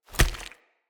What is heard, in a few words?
Kick Impact Rock; Envoy